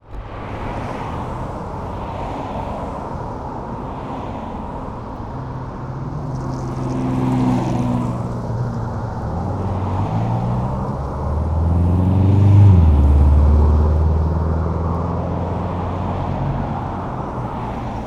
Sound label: City Highway Busy Cars Pulling Out